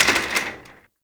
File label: Office Chair Impact; Warframe; Ambience; Nineteen Ninety Nine